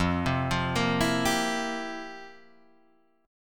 F Major 7th Suspended 2nd Suspended 4th, F, down, Guitar, Drop D